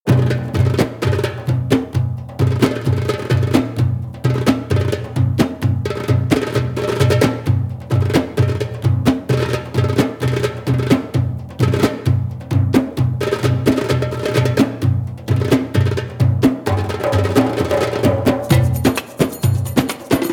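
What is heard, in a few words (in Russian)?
muzyka tureckih barabanov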